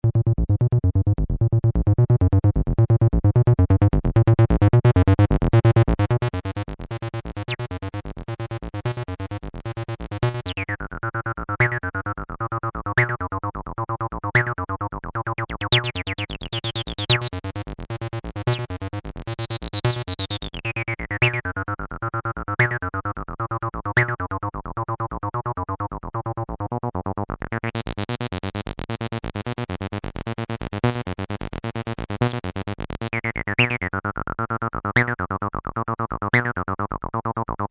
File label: Xoxbox Accent Slide Test